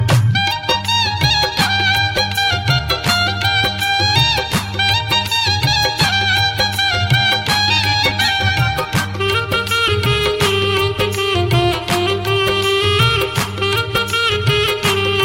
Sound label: punjabi instrumental ringtone